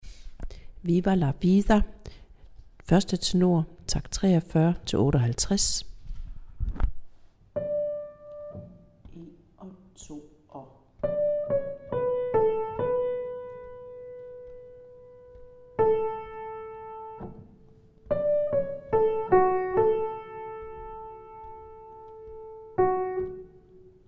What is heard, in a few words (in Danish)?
1. tenor